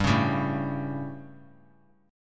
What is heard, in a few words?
G Suspended 2nd Flat 5th G, chord; Gsus2b5 strummed; JGuitar